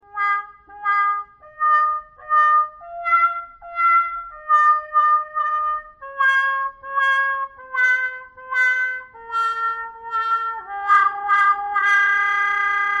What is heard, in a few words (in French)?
Sourdine Wawa Trompette